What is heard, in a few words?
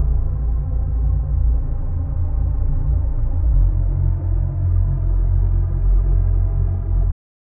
BPM 135